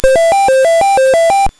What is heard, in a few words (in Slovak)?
hraci automat; technika